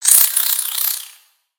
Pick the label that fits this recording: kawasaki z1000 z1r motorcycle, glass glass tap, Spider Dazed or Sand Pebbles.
Spider Dazed